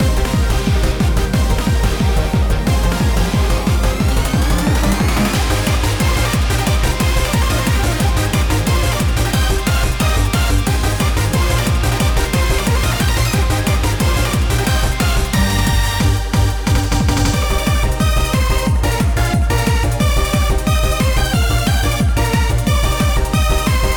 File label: fever stadium climax bpm180